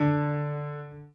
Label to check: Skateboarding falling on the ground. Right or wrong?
Wrong